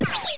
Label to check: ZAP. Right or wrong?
Right